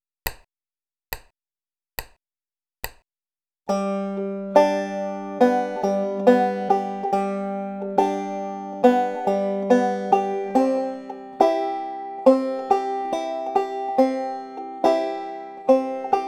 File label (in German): akkordwechsel mit pinch und alternate picking